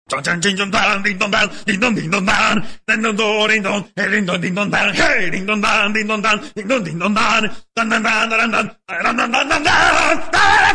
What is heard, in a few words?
Scream Sms